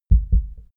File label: heart beat double; koerpergeraeusche